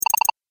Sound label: Collect Chiptune, video game; Chiptune Fx